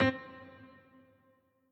piano riff intro

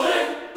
choir

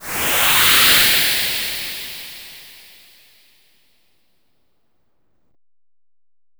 SE MAP MOVE BOOST; maimai

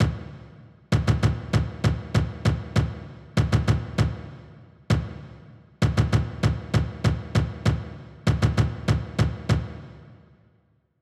kick; 穿山甲

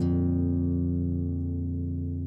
harp

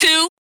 vox

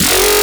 bass

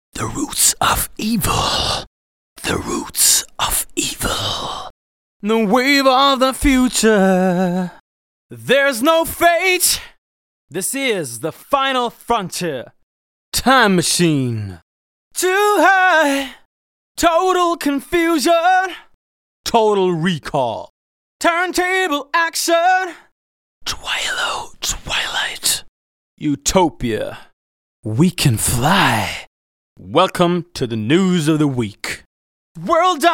Acapella, Techno Trance; Techno Trance Essentials

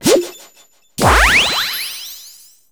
SE RESULT RATING UP; maimai

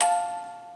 musicbox

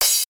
cym

